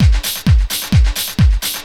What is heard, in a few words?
130 tribal, LOOP PERC TRIBAL; DRUMLOOPS; Ueberschall - Techno Trance Essentials